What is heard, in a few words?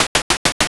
bird flap